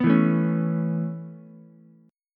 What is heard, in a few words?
C sharp Minor 7th Sharp 5th C sharp Ukulele; C#m7#5; strummed; JGuitar